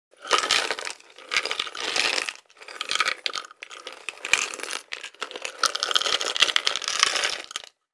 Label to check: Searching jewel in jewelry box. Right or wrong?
Right